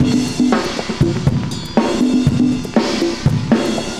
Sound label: cooling 120, loops, 120bpm